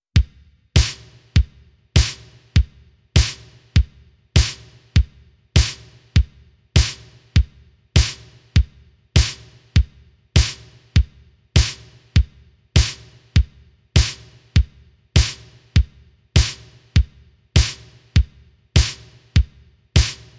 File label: Drums (No Atmo)